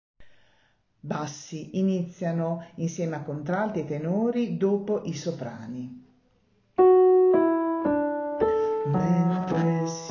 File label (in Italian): bassi